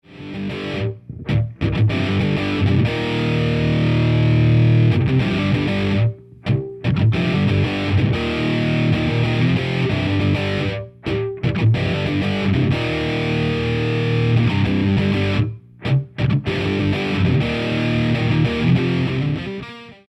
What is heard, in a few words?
mak4 crunch scooped; vh4 ch 3